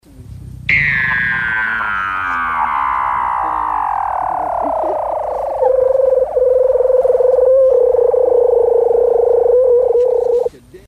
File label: weddell seal; IronChefOfMusic ingredients